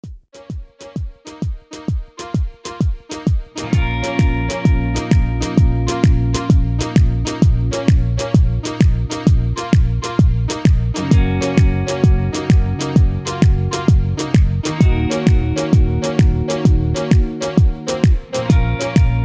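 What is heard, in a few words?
Instrumental no leads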